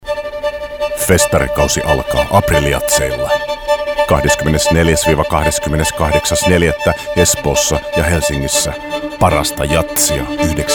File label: Jazz; April Jazz